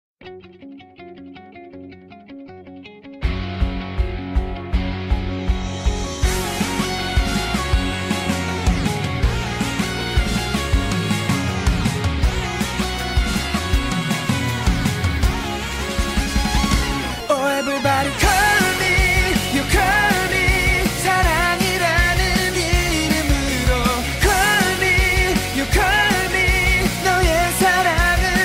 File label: korean drama ringtone; the heirs